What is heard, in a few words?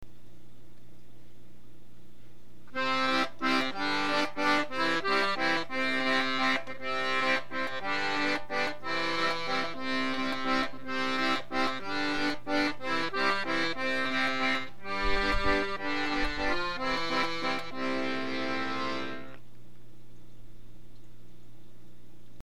pop weasle